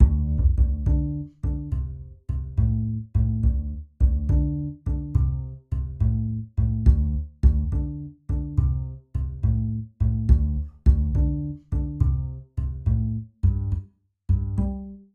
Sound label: Blues Vamp